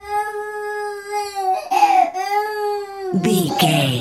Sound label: voice baby cry funny